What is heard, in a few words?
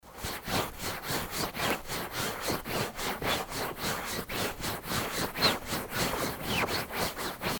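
sound of rustling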